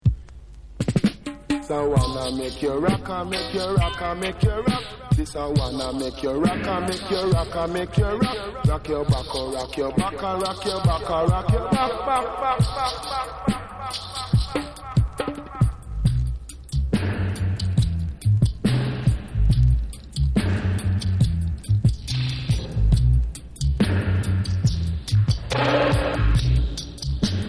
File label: Explosion Dub; Burning Sounds